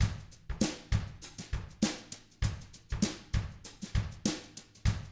FUNKY, Drums

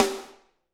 SD, Drums; Bob Clearmountain Drums I

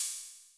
cymbal (low), Yamaha MR-10

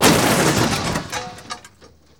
TRUCK JUN, Transportation, Truck Junk

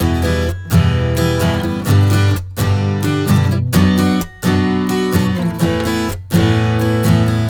Acoustic Pop Guitar 128BPM Emin; Acoustic Pop Guitars; Vengeance; Essential Clubsounds Vol.4; Guitarloops

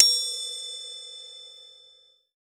percussion special

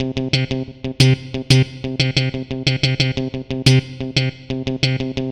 Funky Guitar, Guitar Synths; Dr. Fink's Funk Factory

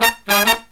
Killer Brass Riffs, SOUL; USB Soundscan vol.29 - Killer Brass Riffs; AKAI

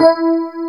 E.PIA E2; AKAI S6000 CD-ROM - Volume 1; Piano; PIANOS

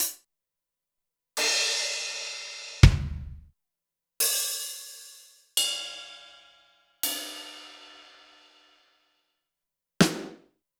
Zoom MRT-3B Kit

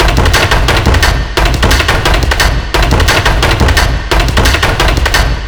orchestral drum attempt

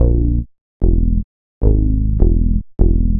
Dark Bass, Drum N Bass

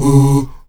AHOO C#3; Spectrasonics

